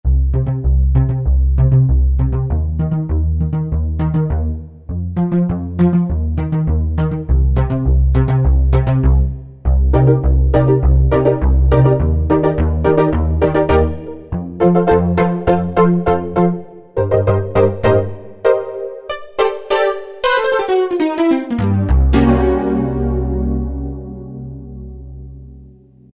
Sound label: EP Synbord, Yamaha TG77; Factory Presets